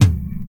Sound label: Royality free tom single hit tuned to the F# note. Loudest frequency: 468Hz; tom drum samples; one shots